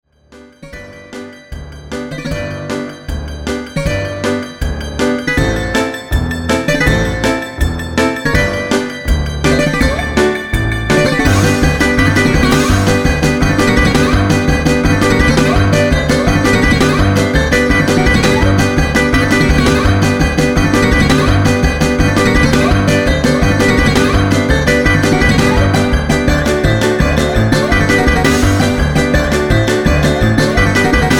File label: Bouzouki Greek Style